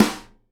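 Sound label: snare nylon damp, Live Snares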